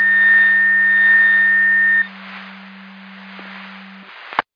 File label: Siren; Hamburg FD